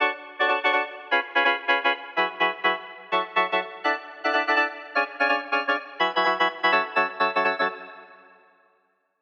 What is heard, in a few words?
Chords Rhythm Funk; midi wizard 2; Unison